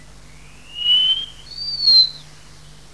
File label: Tichodroma muraria